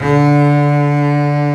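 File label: Bass legato D3; Digital Sound Factory Virtuoso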